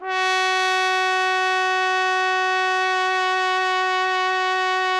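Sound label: TPT, trumpet; Roland - LA Composer vol. 3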